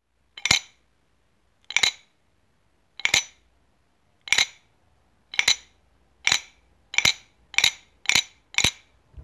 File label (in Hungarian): Kotyogo Hangja; kotyogo kavefozo